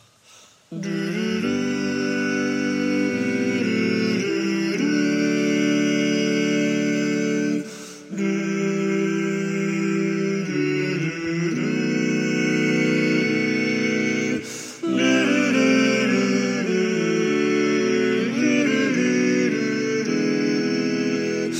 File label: bass left